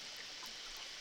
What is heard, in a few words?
Background Noise Clips